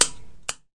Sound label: click